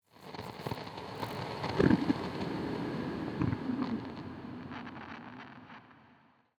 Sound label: addition, nether, warped forest; ambient; minecraft